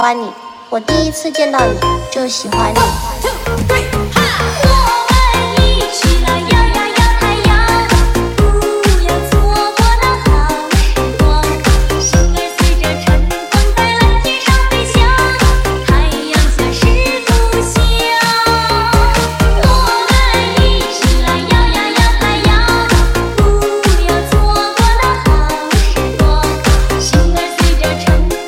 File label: music